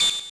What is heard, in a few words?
computer beep